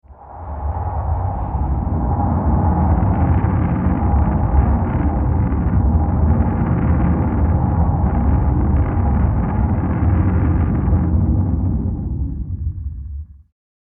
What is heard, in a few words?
Volcanic Fallout With Wind; Scary Soundscape Sound Effects